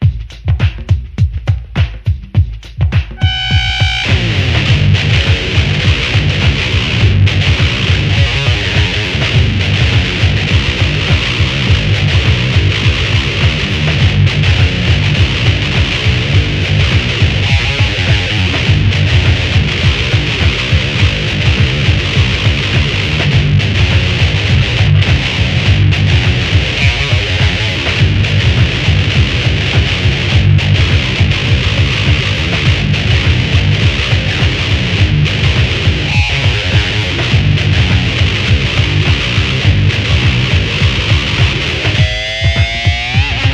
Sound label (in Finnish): riffi